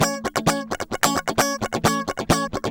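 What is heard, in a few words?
guitar, 130 BPM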